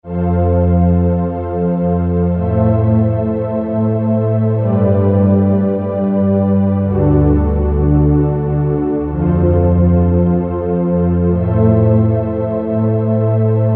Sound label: Soft Sound; Generalmusic S2R